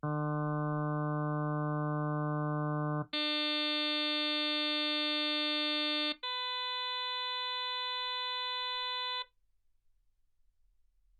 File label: harm sinus 48kHz